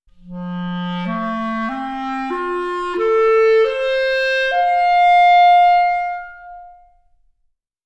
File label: clarinet